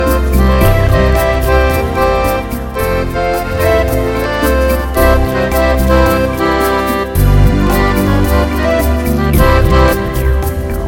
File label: A Sweet Ballad